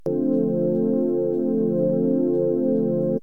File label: CLUB, Rythmes et Intros